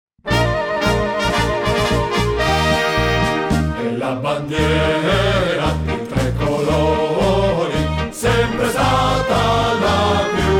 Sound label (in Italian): La Bandiera Tricolore Coro